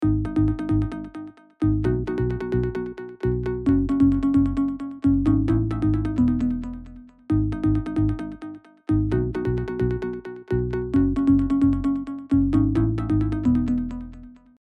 Organ Dance mit Echos

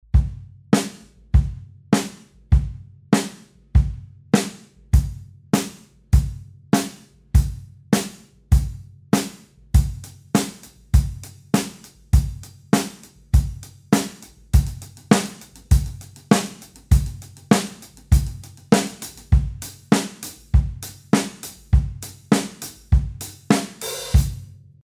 4er Rythmus; Schlagzeug

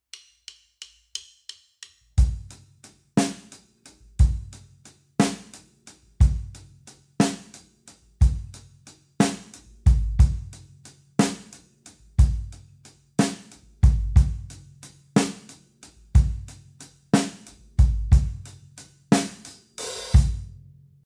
6 Achtel Rythmus; Schlagzeug

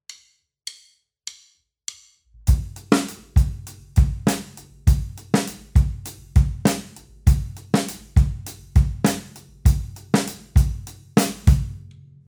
Clave Rythmus; Schlagzeug

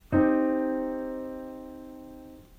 Moll, akkorde dreiklaenge

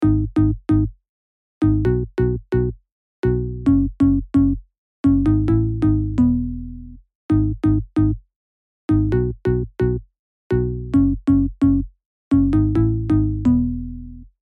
Organ Dance ohne Echos

